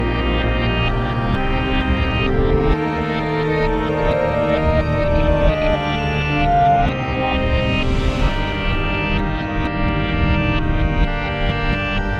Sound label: Main Loop; The Binding of Isaac Repentance; Genesis II Reverse Main Loop